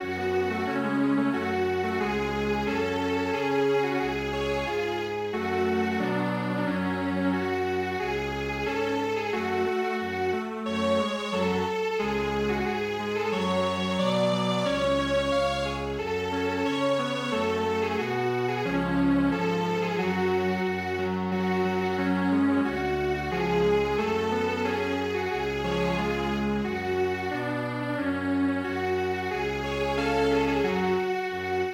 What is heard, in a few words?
Strings Quartet; daikoku